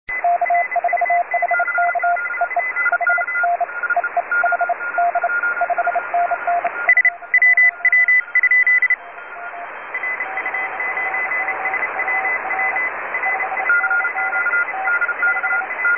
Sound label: 20m filter sample; juma trx2